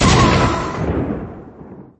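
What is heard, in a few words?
scream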